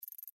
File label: tick; gmod; paris